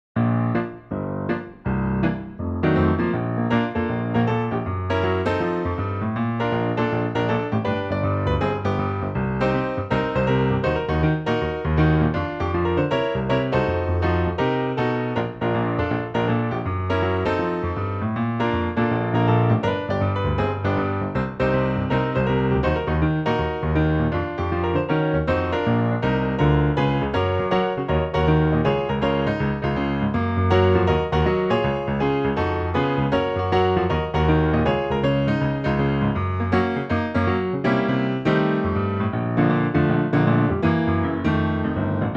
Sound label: Melody